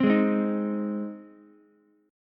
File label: Ab Major Ab down Ukulele; strummed; JGuitar